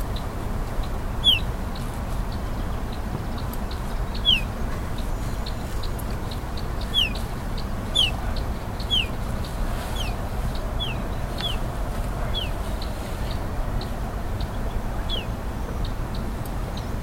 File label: pied bush chat